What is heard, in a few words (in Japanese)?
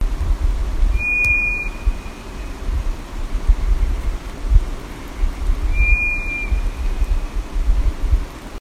ヌエ; 鍵掛峠